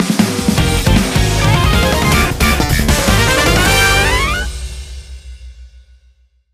Music Game General event

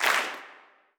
Karaoke Handclaps

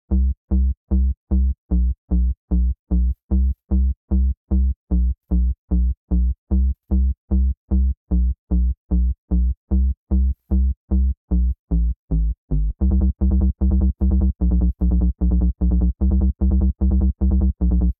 Bassline; chippy

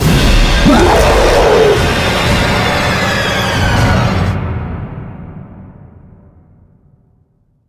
String Large Zombie

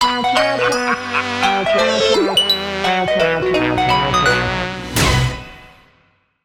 Gameover; Heroki

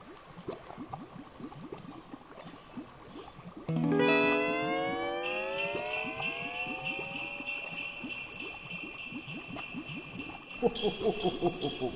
Christmas Ambience; SpongeBob Moves In; android

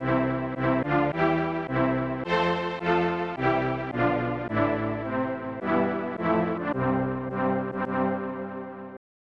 Unused Jingle; Elite Soccer; SNES

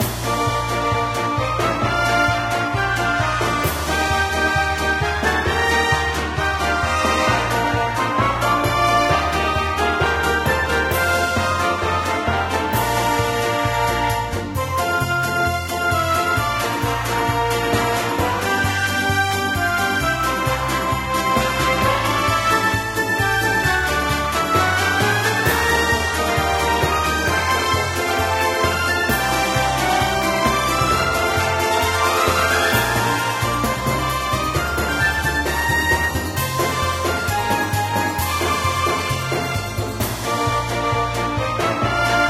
bgm air merchant

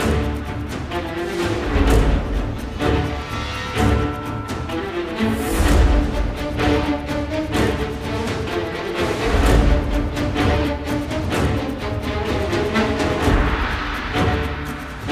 dialog determined

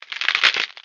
Solidify

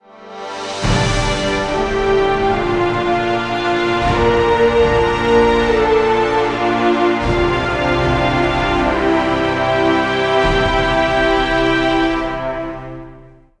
Results Win Sting 75bpm; Dawn of Titans; android

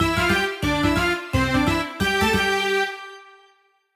Something Good Ditty

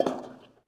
Char Fs Walk Metal; We Happy Few

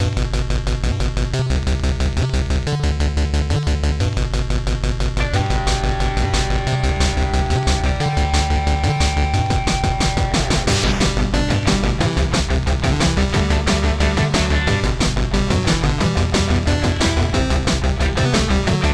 guitar louder; Earthnight

